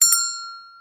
inventory sound

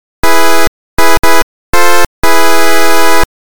win

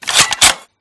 switch; resurviv

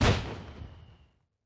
firework; Microsoft Solitaire Collection

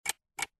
timer

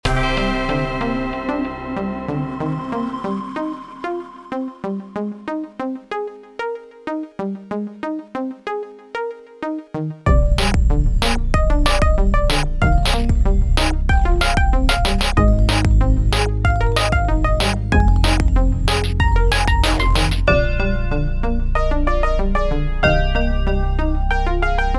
music confirmation and login

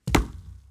wood die

